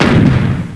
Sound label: pan